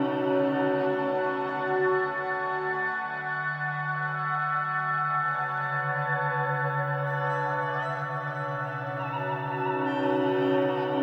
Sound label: Pad, Synthesizers